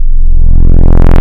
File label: saw rounded to square; Synthetic's Serum Collection